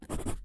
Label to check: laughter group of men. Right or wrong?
Wrong